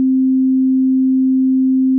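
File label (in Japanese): C4 261, 平均律, サインは; 平均律と純正律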